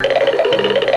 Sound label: TOON; Zero G; Man Machine